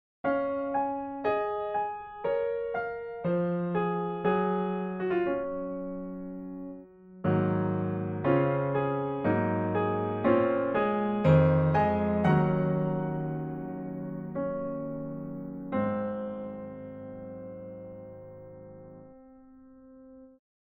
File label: intro piano